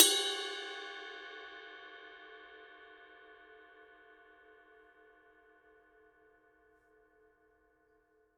signature dark energy 22 dark energy ride mark ii bell